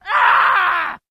Death Screams Male

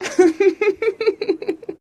Laughter Female Shrt

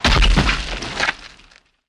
Body Falls On Dirt

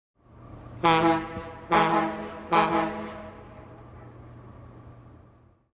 Boat Air Horn Blasts

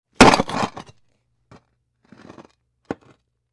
Metal Scrapes Rock, rocks & dirt

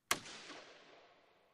Hockey Puck Hits

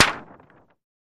Target Hit Light Pap, target range

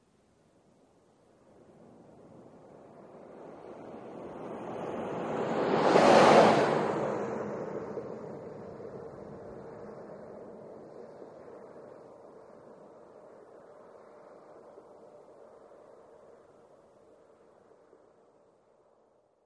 SUV By R To L 25mph

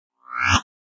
Pop Up Elect Buzz Wash

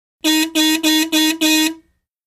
1940 Car Horn Small

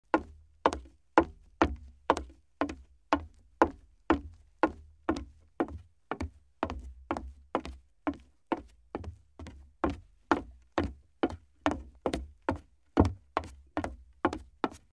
Footsteps Hollow Woo

